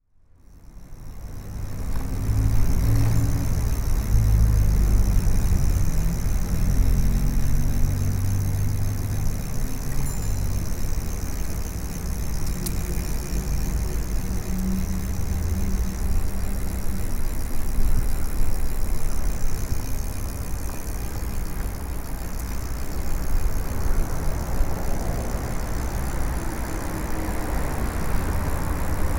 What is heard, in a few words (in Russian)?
velosipeda, transporta; zvuki transporta